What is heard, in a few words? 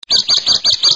tweet